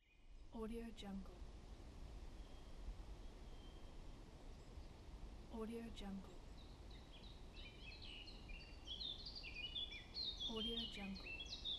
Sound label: forest